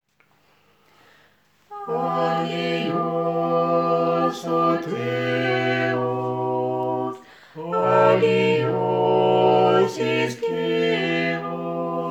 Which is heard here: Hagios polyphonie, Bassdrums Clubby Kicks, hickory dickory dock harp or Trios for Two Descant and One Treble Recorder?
Hagios polyphonie